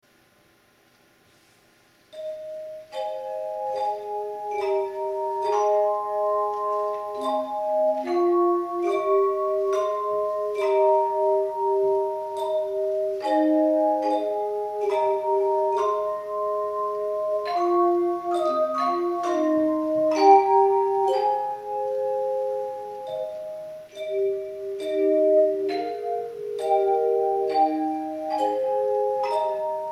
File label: Chimes